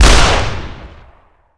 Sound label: fire hunting revolver